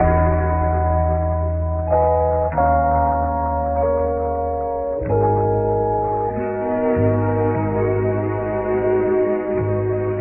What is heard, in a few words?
keys and strings, jazz loops